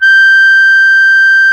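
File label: CONCERTINA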